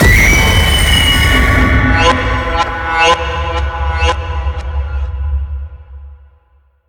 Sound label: Jump Scare Impact; The Inn; Zara